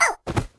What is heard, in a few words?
Beavers Touch and fall; Level3